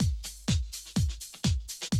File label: 125, Classic Drum Loops